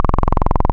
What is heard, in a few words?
VV CMOS LP Saw, wavetables; Animoog